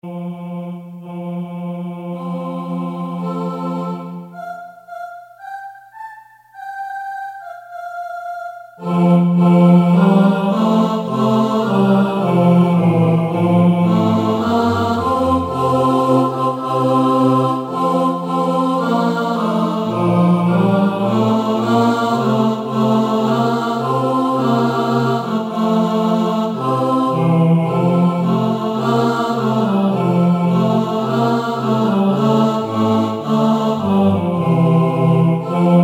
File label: TENOR